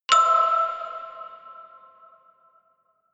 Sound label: notification glockenspiel creepy third